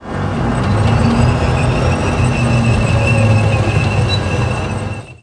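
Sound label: TANK START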